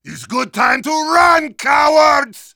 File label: yell; Heavy